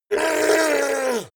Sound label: fishman shout extreme pain